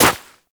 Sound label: Run Snow, Footsteps; Warframe; Characters; Operator